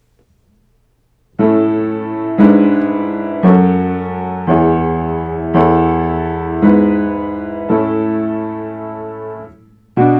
Harmonizing with Non-Chord Tones Aural Training exercise audio